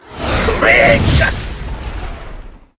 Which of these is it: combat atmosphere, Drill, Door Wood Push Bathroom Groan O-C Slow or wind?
Drill